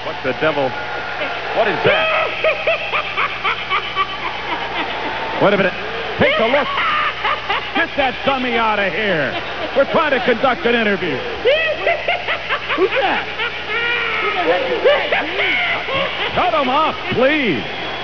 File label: laugh; chucky